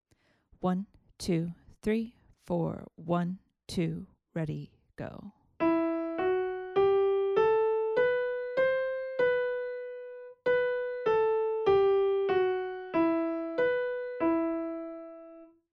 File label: melodic dictation